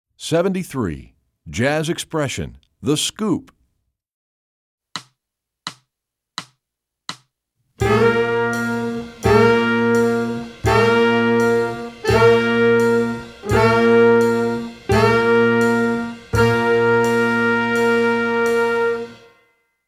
jazz expression bends scoop

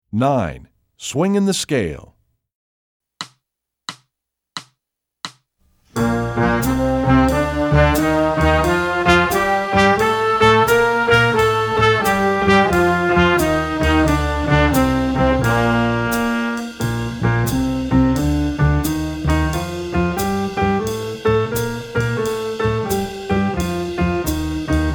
swingin the scale

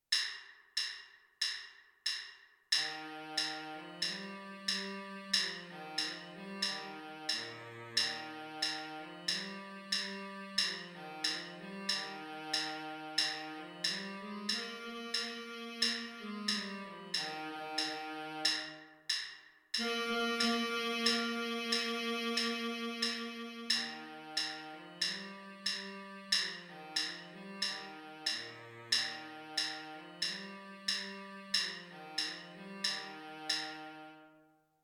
baritone sax; alouette

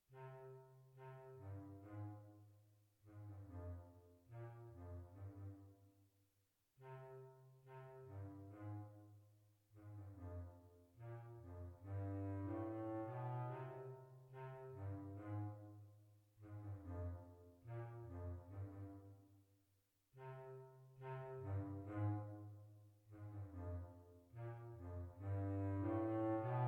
bass clarinet

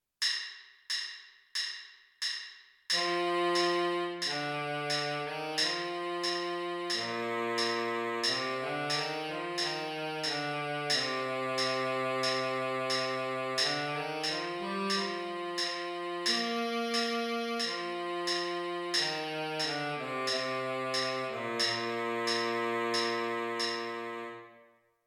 baritone sax

